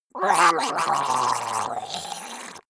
monster die gurgling high; kreaturen

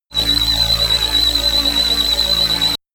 shot aching tooth low; bewegung

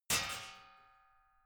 metal sheet crash; metall

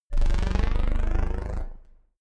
short sci fi sweep; whooshes rises; risers

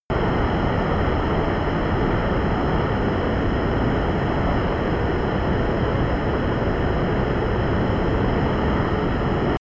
plane bombardier private turbine steady distant; flugzeuge verkehrsflugzeuge; verkehr transport

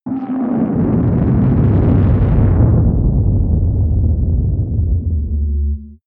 spaceship flyby slow down wobble; raumschiff

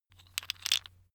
tiles wood rattle; objekte; holz

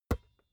basketball catch finger tips hard

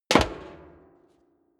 step leather shoes metal rattle jump; schritte

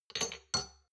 shot glasses stack soft; berufe; gastronomie; soundpool

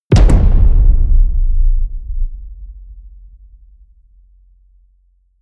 drum hit loose large, percussion

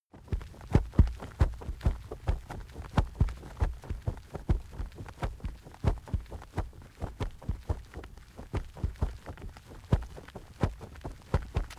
wing flap leather; natur tiere; voegel

